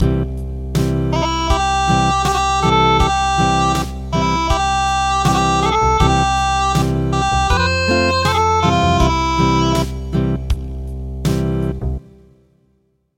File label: Acoustic